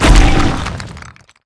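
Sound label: ATTACK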